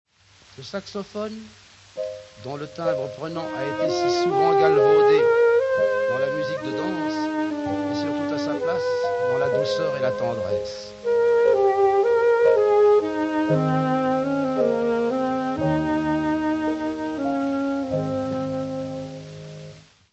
orch saxophone

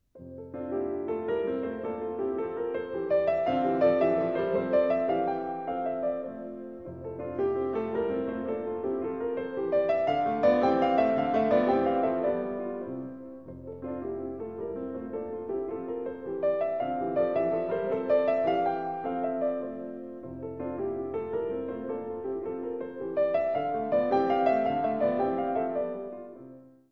berceuse, piano 4 mains

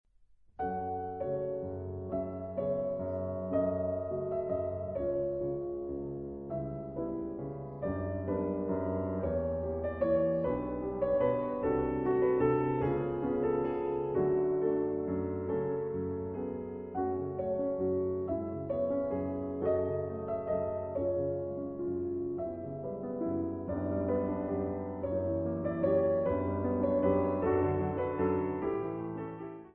piano, 2 pianos; SONY Classics

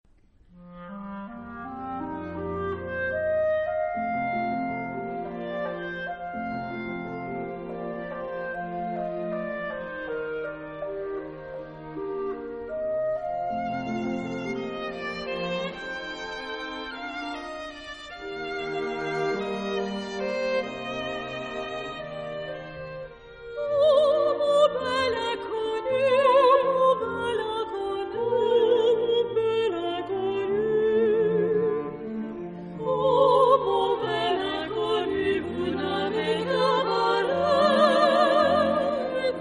Trio

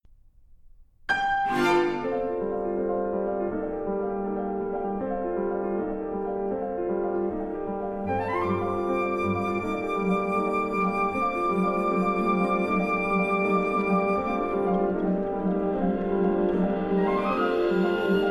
Divertissement TIMPANI

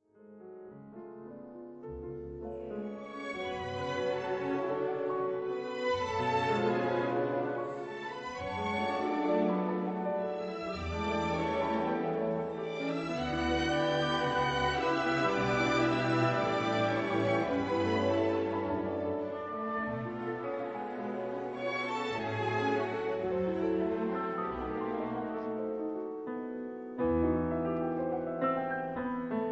piano Reverie; Concertante

